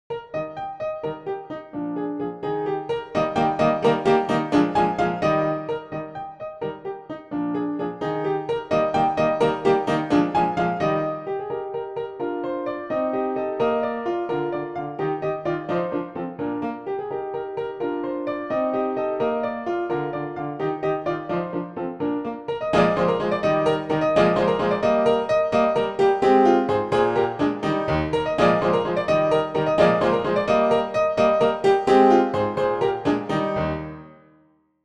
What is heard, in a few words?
Dance PianoTeq Bechstein